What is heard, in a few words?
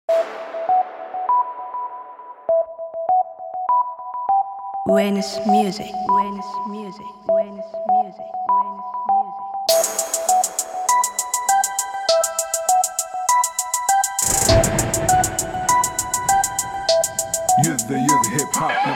Minus, Turkmenrap